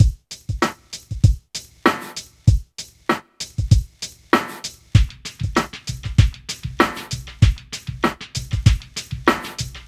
dumb luck, Drum loops Boombap

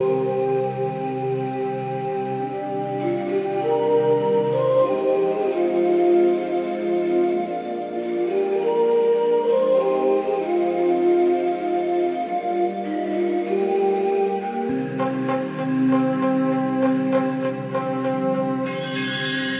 bamboo, Loops Electronic

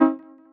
dopler, Synths; Notes

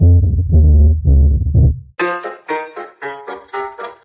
piano old timey bar, loops, phonky

